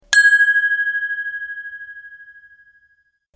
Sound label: bell